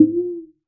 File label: Conga Thumb; Roland BK-5; Percussion